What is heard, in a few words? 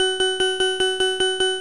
extra life; Juegos Retro; Pacman